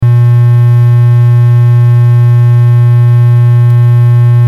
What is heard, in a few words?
buzz